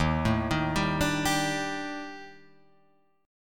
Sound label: D sharp Major 7th Flat 5th, down, Guitar, Drop D